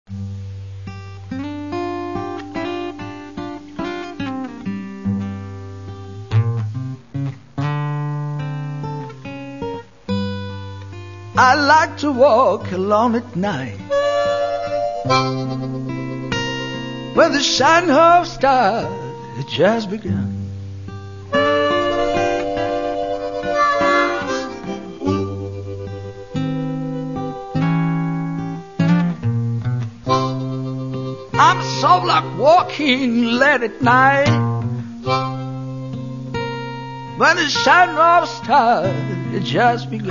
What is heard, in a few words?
save the blues